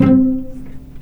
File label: C3 PIZ CEL, string; East West; The Ultimate String Collection